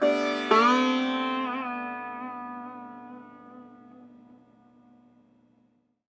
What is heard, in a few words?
d maj sitar chord